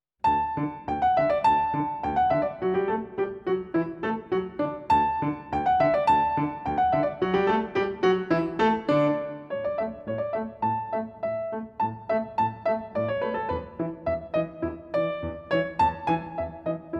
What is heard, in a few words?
Musette in D Major; BWV Anh 126; attr Johann Sebastian Bach; Celebrate Theory recordings